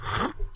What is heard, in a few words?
swallow